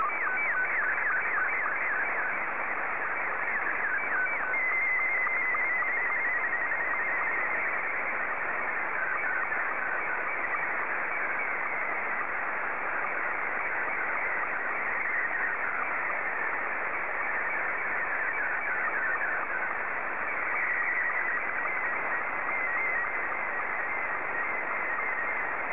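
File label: Scottie 2, slow-scan television; JL1FQZ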